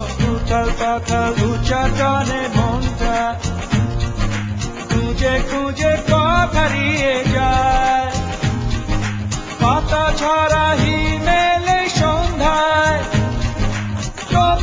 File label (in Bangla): Bangla 90s Popular song